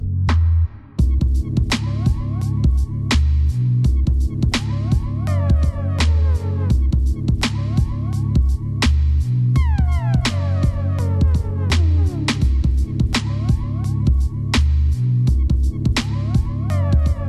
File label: Trippy Hip Hop